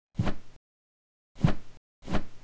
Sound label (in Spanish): Patadas